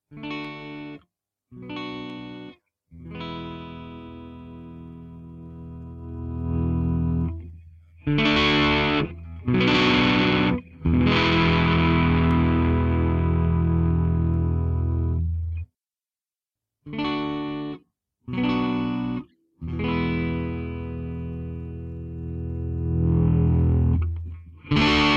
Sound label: chords both neck